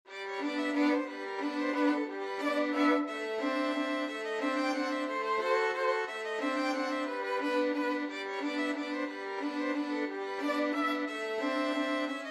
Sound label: strauss fledermaus, violin duet